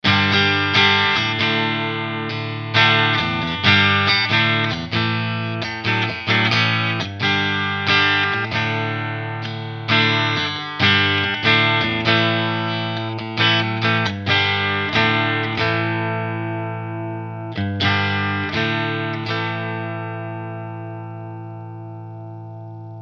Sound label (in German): steg clean; harley benton te-70 test